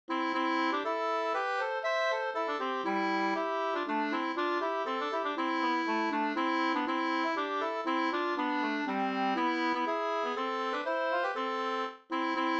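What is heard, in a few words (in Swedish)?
polska, tvåstämmig; Norrland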